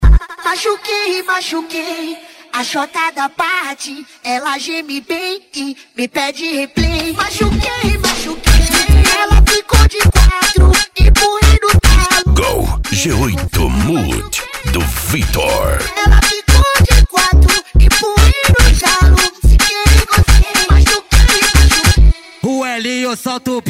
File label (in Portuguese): funk ritmado